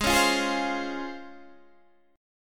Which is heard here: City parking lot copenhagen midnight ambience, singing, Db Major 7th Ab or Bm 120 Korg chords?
Db Major 7th Ab